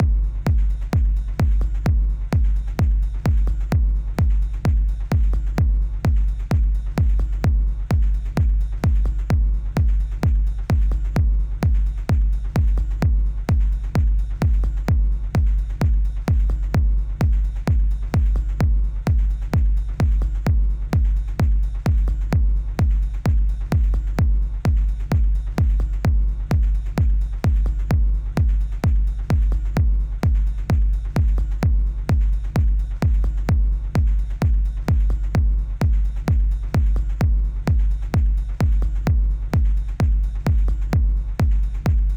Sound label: Techno Dark